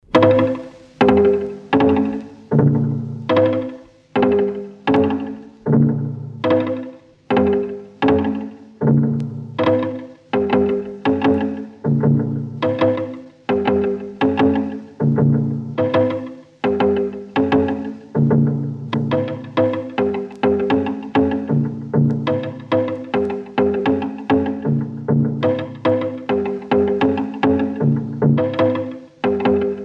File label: ascend + descend seq i, Electronic Sound Patterns; His Master's Voice